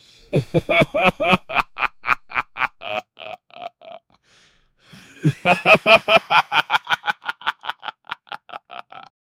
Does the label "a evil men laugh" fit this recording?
Yes